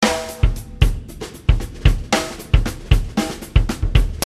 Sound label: Pop